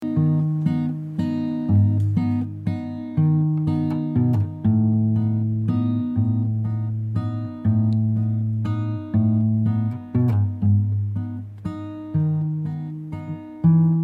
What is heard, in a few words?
guitar